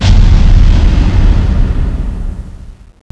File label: step, garg